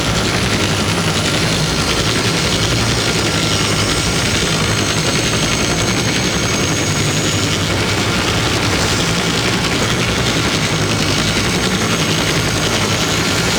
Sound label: Grineer Dropship Loop; Warframe; The New War; Drifter Camp